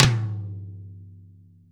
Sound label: Tom, Percs